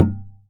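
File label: Percussion Tabla; Polyend; Simulacrum Sounds; 1 Shots; Acoustic and Electric; Drums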